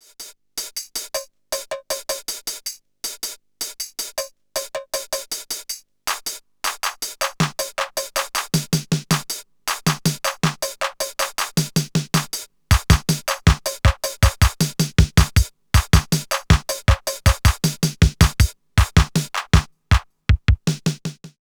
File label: 707 Drums and Percussion